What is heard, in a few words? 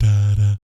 "BS DA DAT", vocal jazz fx